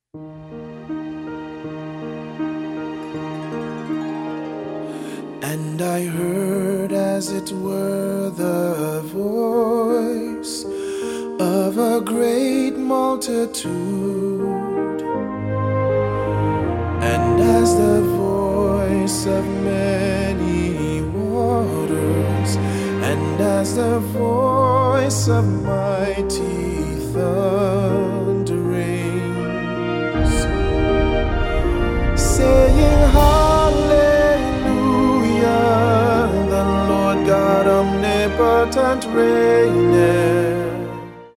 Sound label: with orchestra